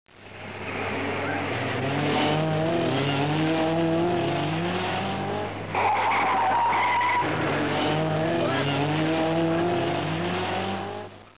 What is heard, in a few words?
howl down subway screeching around corner